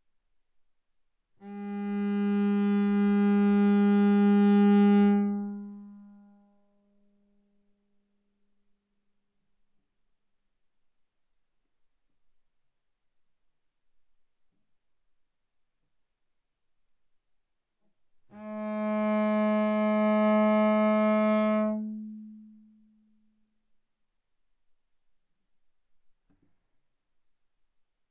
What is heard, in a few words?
Viola arco sul A ff A4 B4 stereo